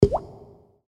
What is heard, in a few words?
water drop with reverb